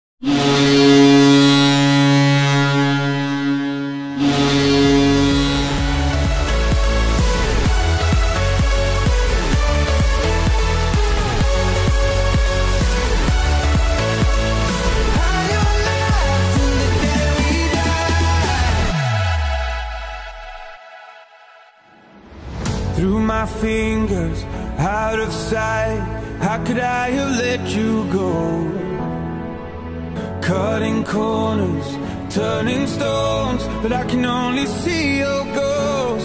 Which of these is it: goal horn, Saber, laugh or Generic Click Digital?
goal horn